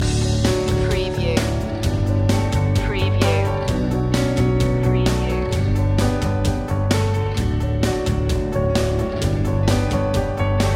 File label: easy living loop